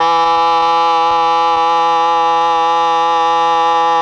OBOE; ILIO; Propeller Island Legendary M400; BRASS+OBOE

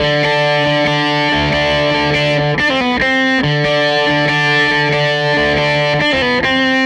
Strat Guitar Loop

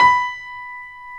WARM PIANO

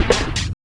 Bumpers, Fx; Sonic Foundry (Sony Creative Software); Esoterik Beatz; Bleuy

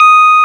PUNCHREEDS; Wizoo - Powered DX

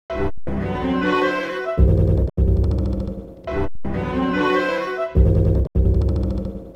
serious strings; Polyend; Loops n trouble

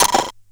Bent Odd, glitch kit

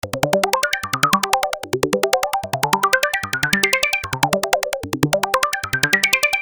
Arpeggio 150 G, Techno Synth Loops; 150bpm; Sonic Foundry (Sony Creative Software); Signals I

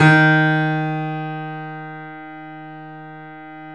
D#, 3-D Audio Collection, 3D Pianos, Yamaha C7; E-MU Producer Series Vol. 5

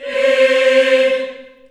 C4, Choir

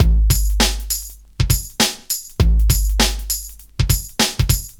Loop, Roland; Sound & Vision - Gigapack I

